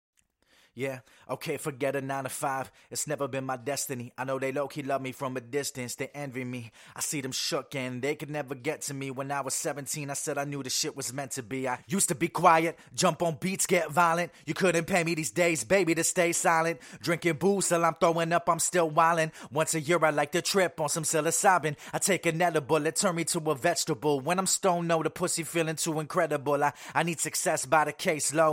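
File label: hip hop, 95, E minor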